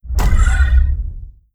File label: dimensional horror tentacle attack; Stellaris; guardians